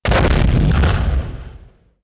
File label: Impact; Falcon 40